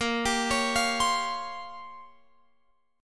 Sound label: Bb Minor 6th Add 9th Bb down Guitar Open F; Bbm6add9 arpeggiated